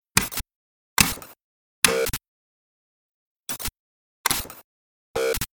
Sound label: robot hurt sound; ddnet